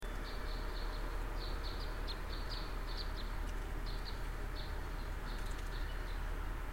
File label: crossbill